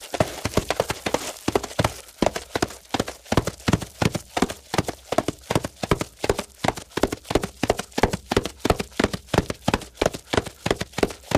Horse Run Fast, Foley Effects

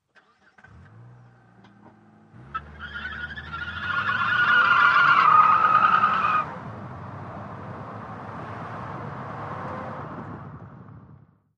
Sedan Mid ST Peel Out

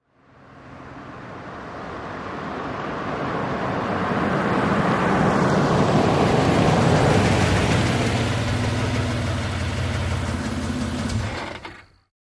Montero, 1998 mitsubishi montero ext.

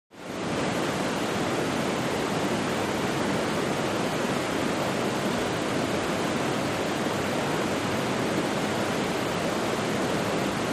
Waterfall - Distant And Thunderous

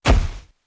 Camera flash, flash pot, good poof; cameras

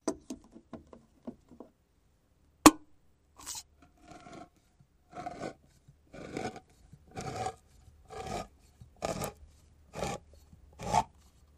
Can Opener Manual Op, utensils

